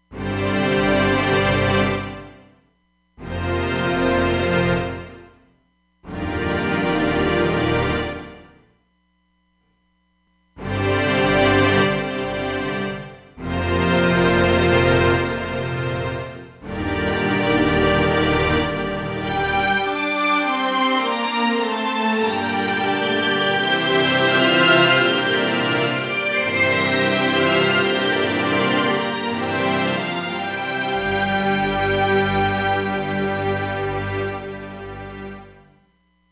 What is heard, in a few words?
Reverse Bounce, Roland DEP-5; Factory Patches